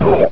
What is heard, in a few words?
kick, combine soldier, far; hl2